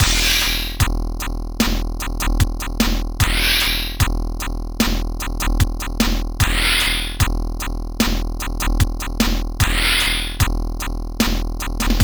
amiga in game; turrican iii

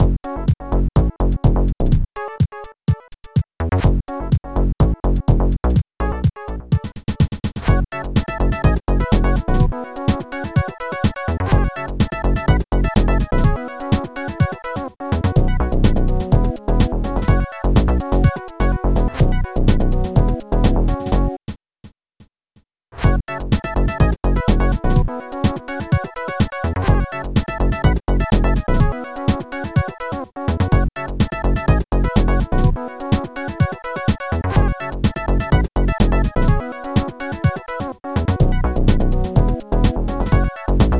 amiga tune; jaguar xj220

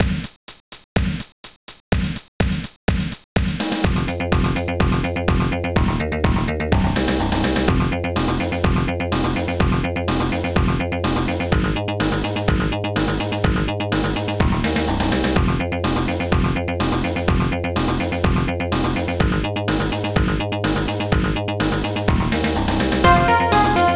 loader; pure metal coders

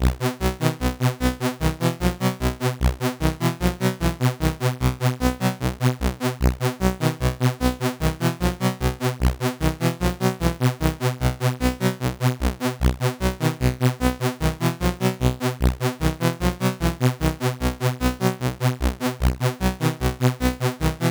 c64 in game; lazy jones

